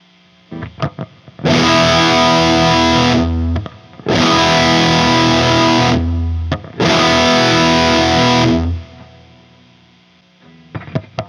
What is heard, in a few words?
recto axe